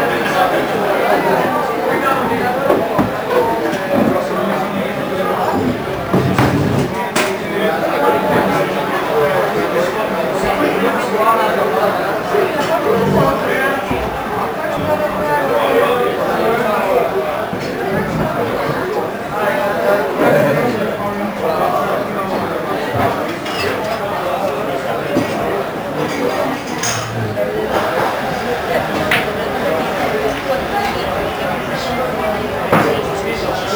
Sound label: ENV INT Amsterdam pub